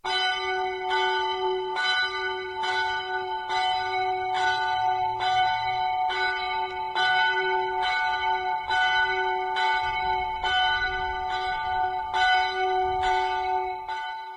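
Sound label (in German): fis, Glocken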